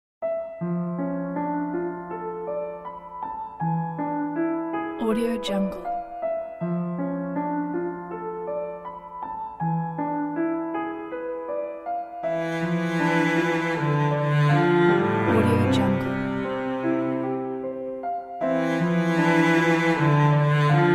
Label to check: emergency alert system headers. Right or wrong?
Wrong